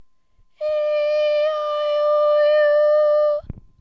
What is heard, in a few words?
aeiou High